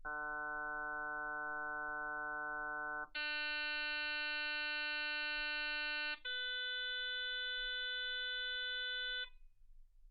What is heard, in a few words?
harm sinus 48kHz